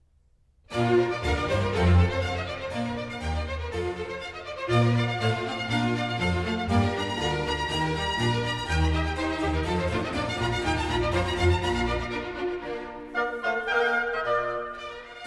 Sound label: Concerto a due chori in B flat major, A tempo ordinario; HWV 332; Handel Masterworks; Klassika